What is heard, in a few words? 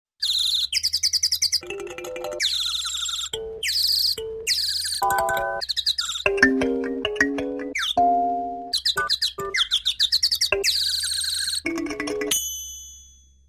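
Various Funny Knocked Unconscious Sounds with birds; Hollywood Edge Cartoon Trax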